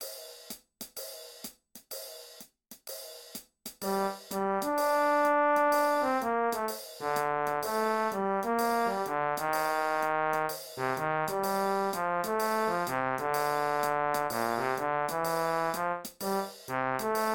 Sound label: Fugue on a Jazz Theme